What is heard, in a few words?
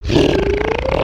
Tentacle Monster Growl; destructibles